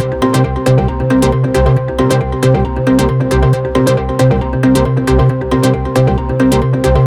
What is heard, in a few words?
Melody 3, 136BPM; Alone Melody 3